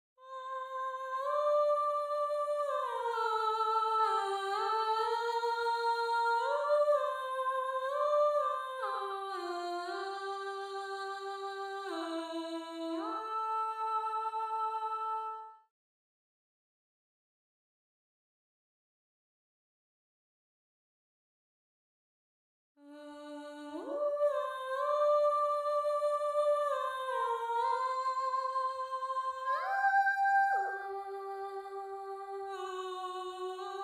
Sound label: Alto, counterpoint